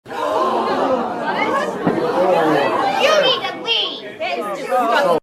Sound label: be shocked